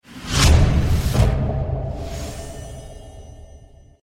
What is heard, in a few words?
preloader sound; games; poker; American Poker The Legends II